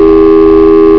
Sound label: Phone Sound; psion cd vol1